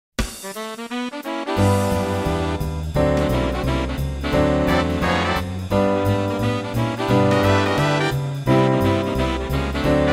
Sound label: swing